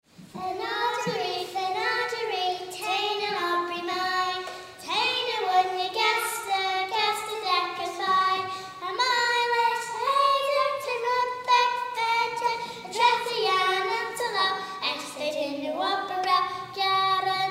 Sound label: Bunscoill Ghaelgagh pupils; Education; MANX FOLK AWARDS